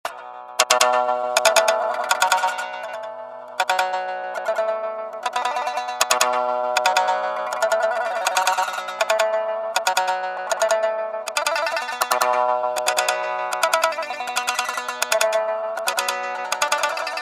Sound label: Shamisen; phasegear